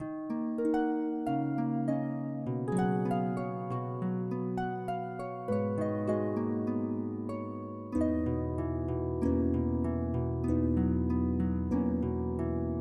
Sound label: Game Over; Final Fantasy [PS1 + PS2]